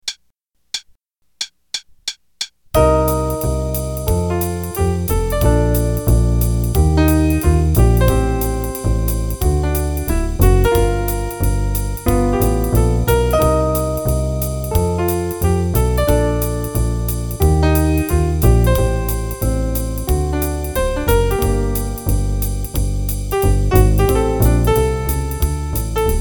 syncopated melody qn=180, Jazz Improv Play-a-Long

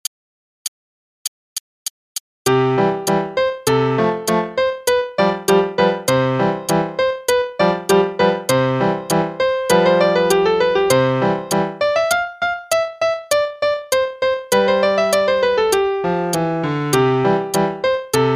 Trumpet Concerto (qn=200); Faber; Adult All-in-One Book 1

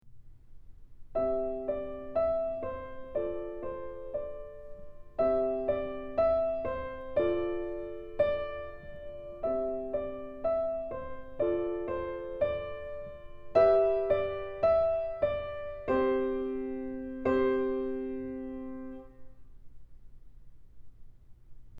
Melody; Keith Snell Level 00 Romantic 20th Century

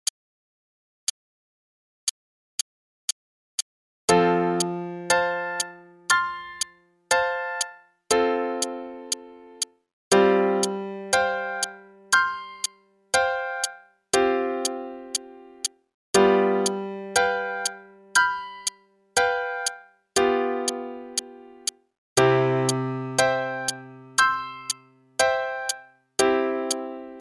Chord Etude (qn=120); Faber Adult All-in-One; Adult All-in-One Book 1

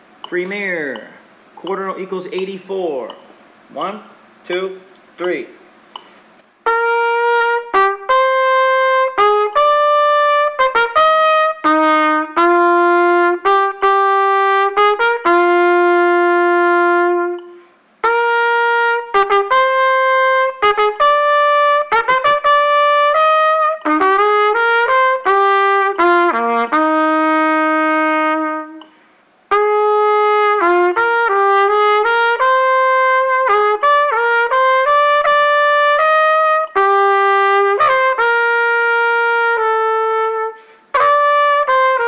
Play-along, qn=84; Premier Solo; trumpet

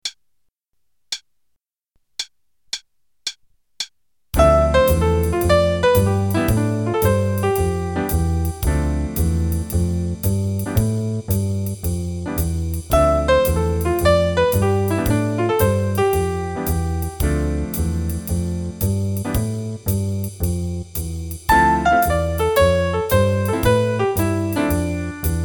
Improv D min with Jazz Rhythms qn=112; D min7 (D Dorian) (32 bars; Trading with Jazz Rhythms, Set 3; Jazz Improv Play-a-Long

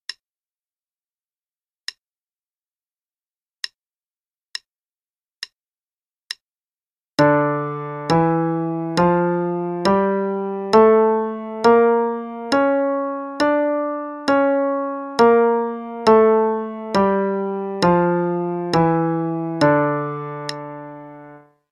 d harmonic minor Scale; CM Level 2

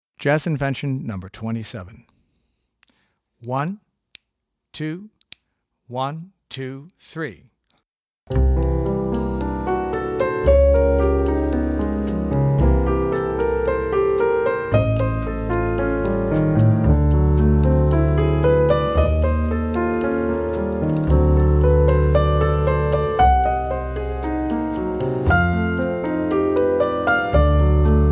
Jazz Invention No. 2

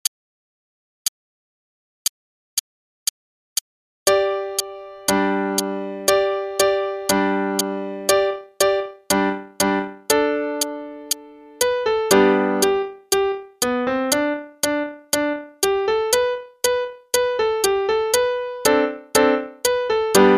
American Fiddle Tune (qn=120); Faber Adult All-in-One; Adult Book 1 (All-in-One)

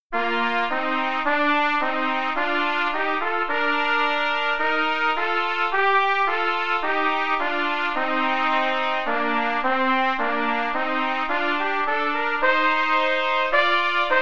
Duets, qn=108, trumpet; Amsden Duets

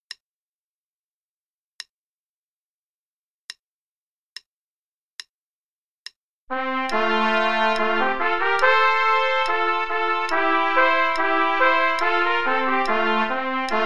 Play-a-long, both parts, qn=72; Rubank p.48 Der Freischutz; trumpet

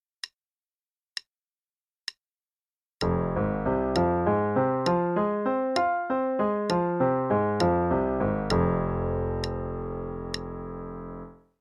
Arpeggios, f minor (qn=66); CM Level 6

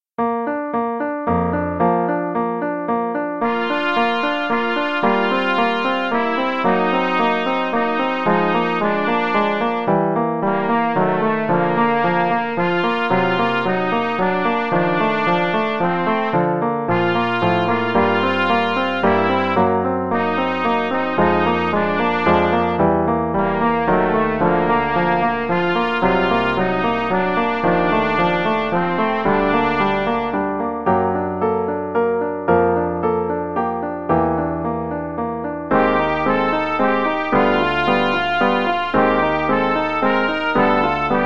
no click, qn=112, no dynamics, trumpet; The Really Easy Trumpet Book

